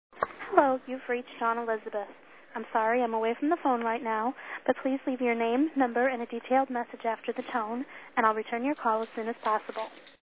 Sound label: phone